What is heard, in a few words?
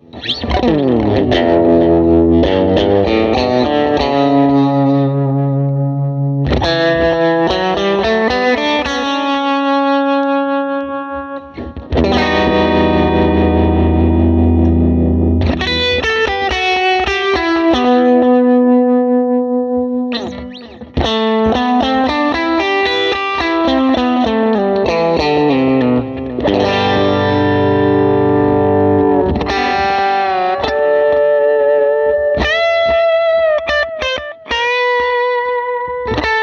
strat; vox ac30